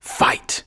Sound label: fight; dairybeatboss